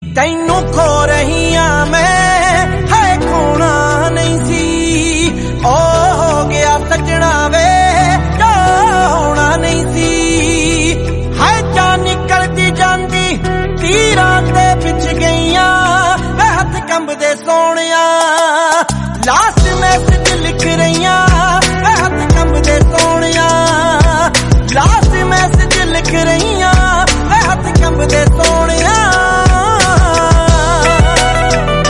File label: punjabi